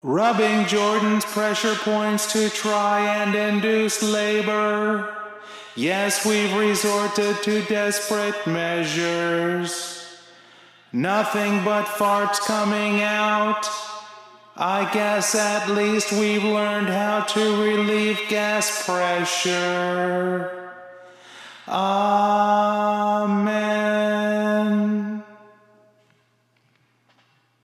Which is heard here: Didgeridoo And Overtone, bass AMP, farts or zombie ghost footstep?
farts